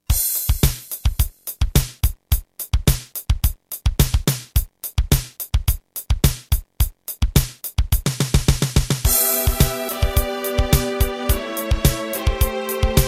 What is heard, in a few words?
Terratec MiniWaveSystem TTWT